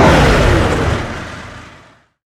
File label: BTR80 Engine Acc End; DCS